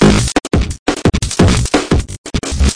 174 bpm distorto jungle, drum loops and breaks

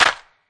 urban trap clap; Urban Trap Kit; Hip Hop; STYLE KITS (Hip Hop, Etc)

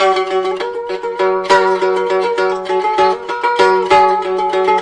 moon lute strummy melody; WORLD LOOPS

